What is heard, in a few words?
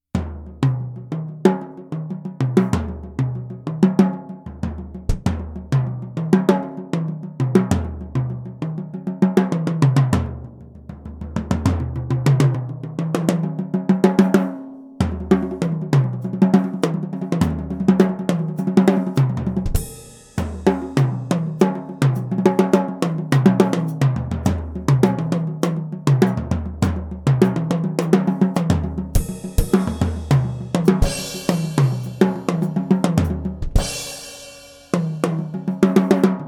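Pearl Export Snares Off, drums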